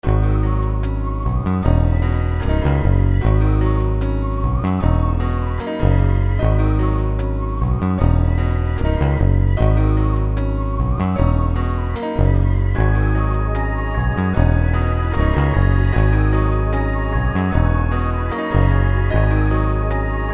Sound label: 151 G m melodic soulful